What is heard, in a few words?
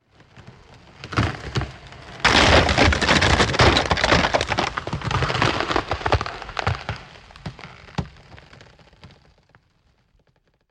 Iceberg impact